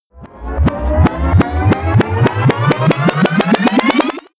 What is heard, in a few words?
tape rewind